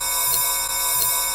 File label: ride, drums; CMP - Analogon